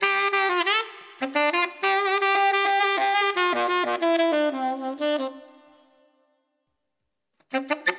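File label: G Major 12 beat 90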